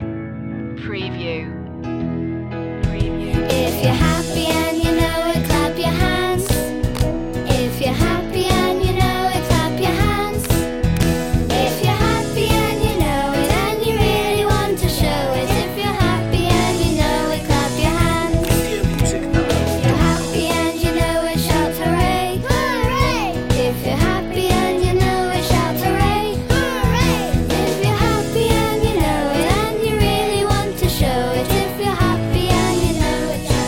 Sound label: if youre happy and you know it children vocals